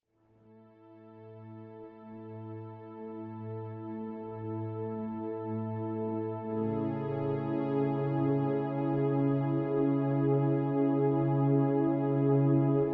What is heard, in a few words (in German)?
gitarren picking; hintergrundmusik